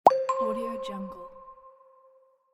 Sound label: pop ding notification